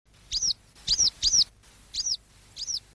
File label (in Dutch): gele kwik, trekroepjes; tijdens de vogeltrek